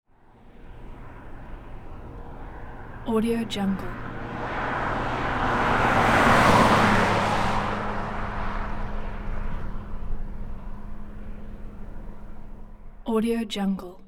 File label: honda accord; 1991; prosoundfx